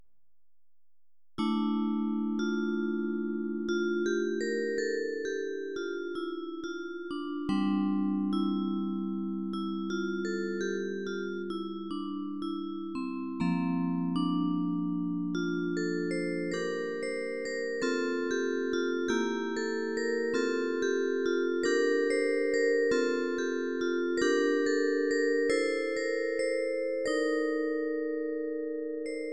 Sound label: vibraphone